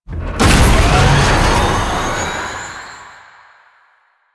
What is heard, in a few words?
Elevator Speed Up; JadeShadows; Ascension